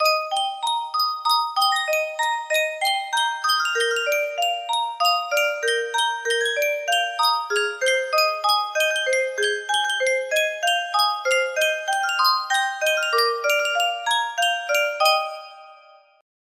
yunsheng music box, melody

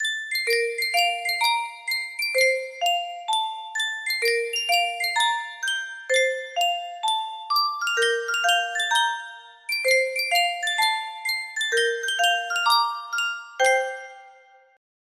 sankyo custom tune music box, music box melody

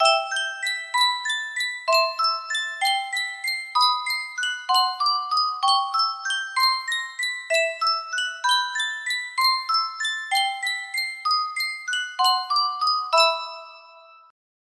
Yunsheng Music Box, music box melody

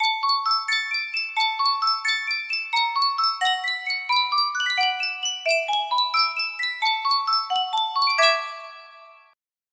Sankyo miniature music box

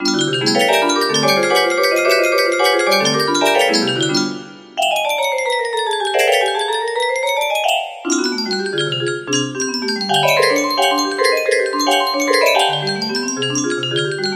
music box melody

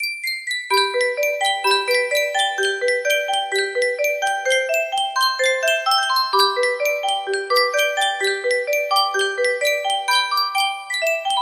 yunsheng custom tune music box, music box melody

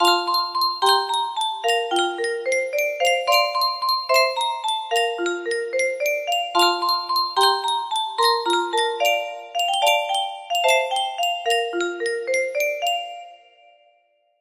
music box melody

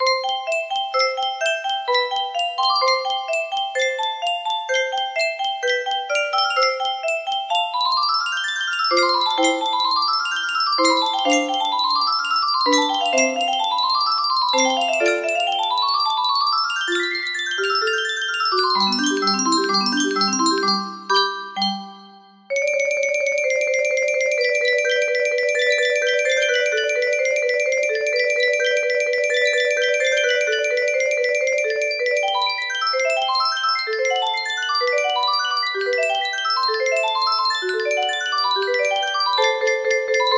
C major, music box melody; mozart piano sonata no 16 in c major k 545 1st mov

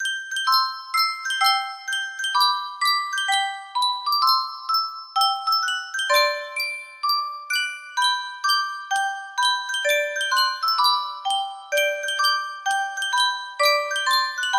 Sankyo music box, music box melody

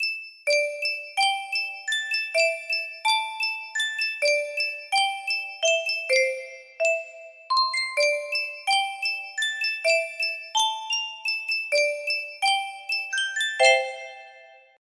sankyo custom tune music box, music box melody

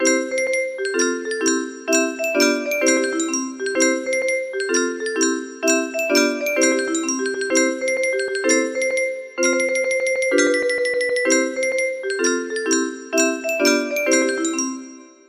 shuffle beat 128 bpm, music box melody

